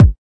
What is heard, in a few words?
kick